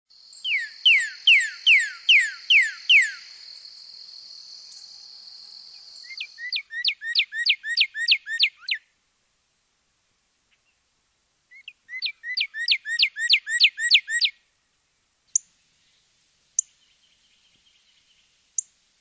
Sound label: Northern Cardinal